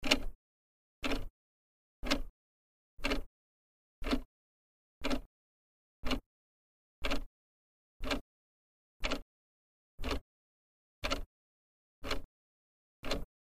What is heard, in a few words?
clock hand